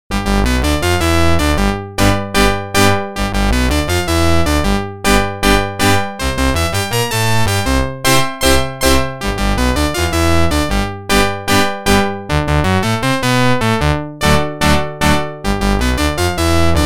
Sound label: Organ; NHL 94